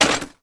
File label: industrial hand cart bounce down single concrete step with boxes on; sound effects one